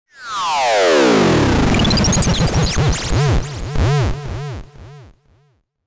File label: sound design shut down fm delayed